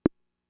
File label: Conga, Fricke MFB-522